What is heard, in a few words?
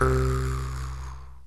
JEW'S HARP